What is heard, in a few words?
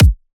Kick